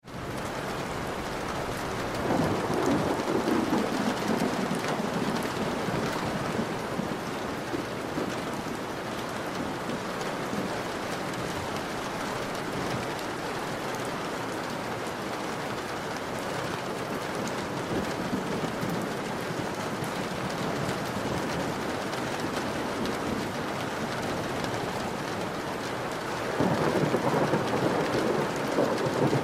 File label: Rain on window sill with distant thunder